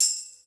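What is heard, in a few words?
TAMBOURINE, Hip Hop Misc Perc; E-MU Producer Series Vol. 8 – Platinum Phatt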